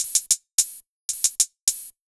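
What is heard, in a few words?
110 hi hat loop, Ragga & Dub Reggae; USB Soundscan vol.27; AKAI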